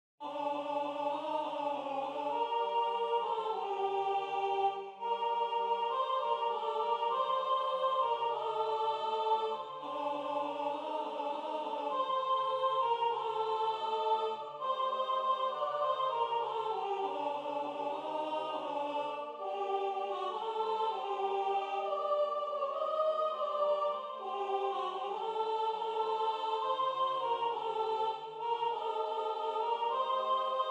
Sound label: soprano